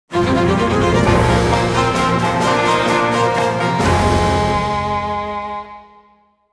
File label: Orange Star National Anthem (Alternate); Advance Wars 1+2 Re-Boot Camp